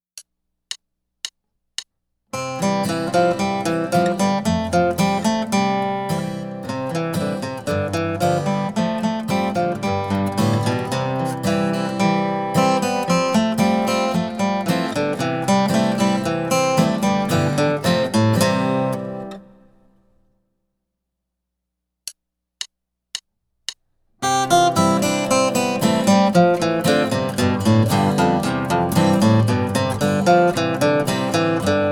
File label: Scale Studies